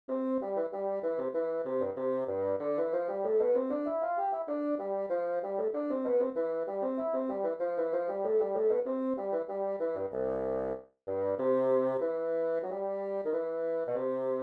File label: Bassoon